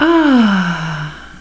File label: SIGH